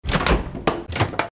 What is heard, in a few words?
door lock